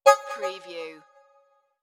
Digital Chime Signal Vox Click

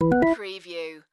Casino Slot Machine Organ

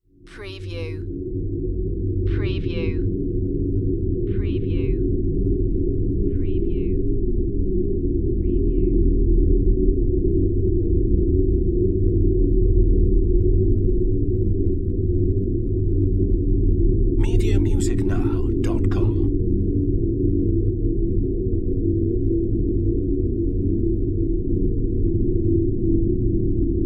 Drone Ethereal; Sound Effects Bible

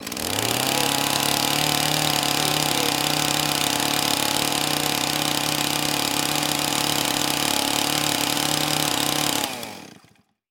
Chain Saw, Run, Turn Off

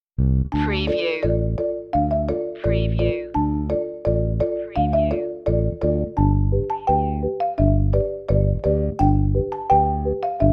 marimbas and bass

